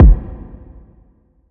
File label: Kick bang